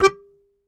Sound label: Very Short Note, Melodica